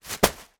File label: plastic bag items inside drop on floor; foley; zapsplat